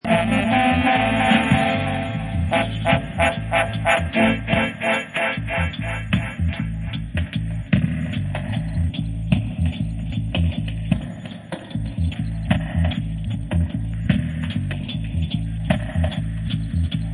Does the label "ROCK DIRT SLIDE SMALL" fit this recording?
No